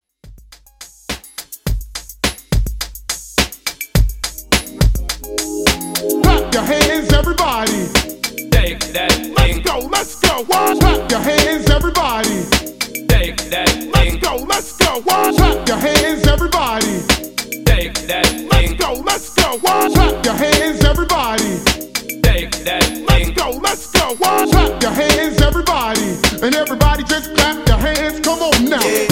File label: RnB, 105 Bpm